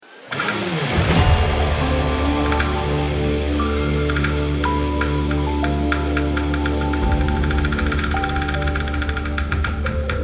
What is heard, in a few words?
bomb ten sec count; perfectworld